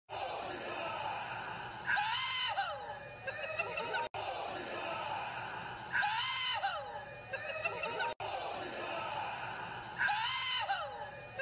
yeehaw

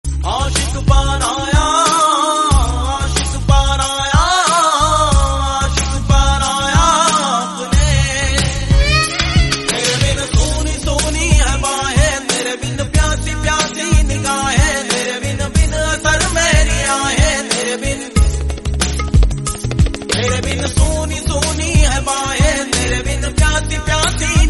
title song hindi

